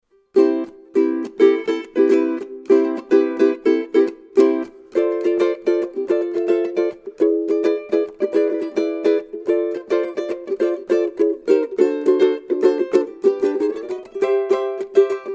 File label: Walnut Ukulele